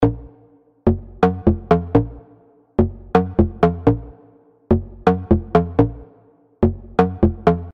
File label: synth